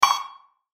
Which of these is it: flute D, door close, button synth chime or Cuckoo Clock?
button synth chime